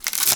wood creak

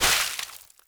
blood splatter

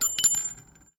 Bullet drop

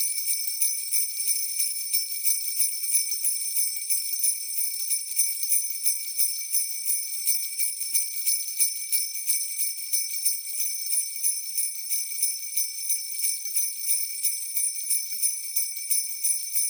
jingle bells

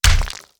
rock smash

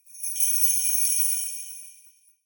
sleigh bells

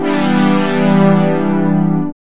SYNTH

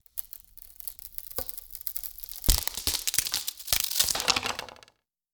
wood tension break

